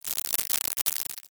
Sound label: applause